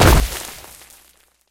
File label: ceramic fall; Golden Crayon Sounds